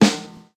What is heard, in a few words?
verby steel snare drum sound g sharp, Royality free snare single hit tuned to the G# note